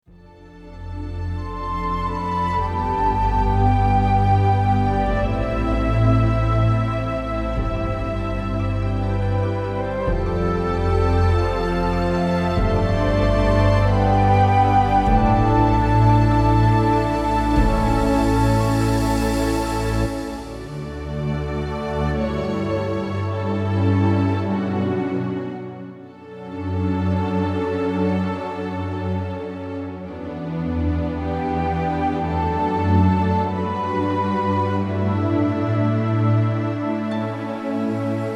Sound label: the beauty of winter epic orchestra majestic music